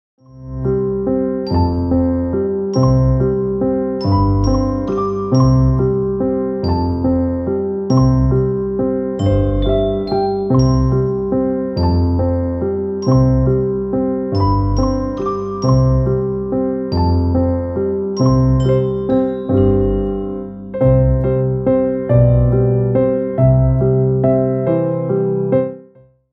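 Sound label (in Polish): podkład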